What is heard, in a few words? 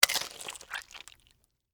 Squeeze Crunch Egg, Shell; Texture; Vegetable; Squishes; BlastWave